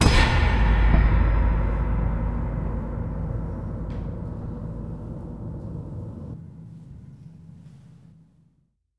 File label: Metal Hit Echo